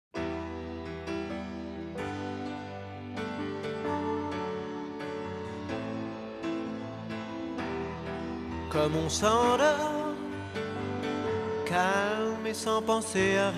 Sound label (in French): choeurs voix graves; NOS VOIX CONTRE VOS LOIS